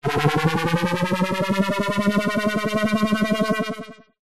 sound design fluttering ascending digital siren style tone